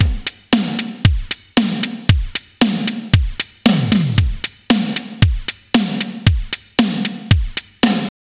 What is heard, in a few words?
115 Beat Synthetic; Zero-G; Future Wave